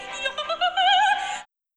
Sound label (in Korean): 웃음소리